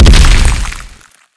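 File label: wonder cannon ex explosion; zmall; zm boost; privilege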